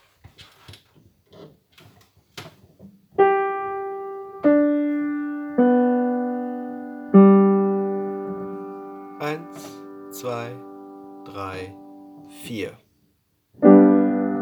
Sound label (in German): Kadenz mit 4 6 Vorhalt und Dv